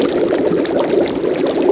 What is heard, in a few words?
cauldron; DAoC